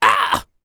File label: A YELL, MALE HUMAN; ILIO; Vocal Planet